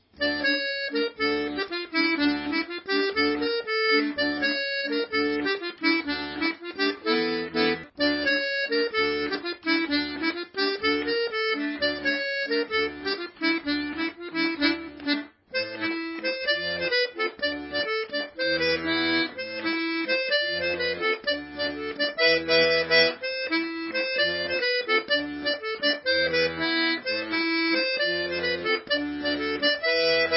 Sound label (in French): bourree